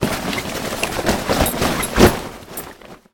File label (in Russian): prodolzhitelnoe monotonnoe shurshanie veshej